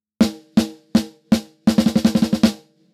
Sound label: snare; Lindell 50 Series; Snare 2